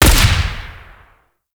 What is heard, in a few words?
Quill Dart Gun Shot; Warframe